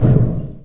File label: metal grate impact soft; physics; Garry's Mod; hl2